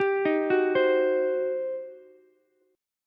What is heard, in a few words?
G Major 7th Suspended 4th Sharp 5th, G, down, Ukulele; GM7sus4#5 arpeggiated